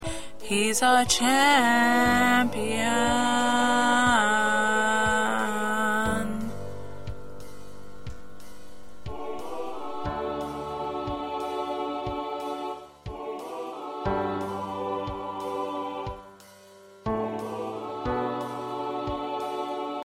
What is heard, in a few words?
2nd Lead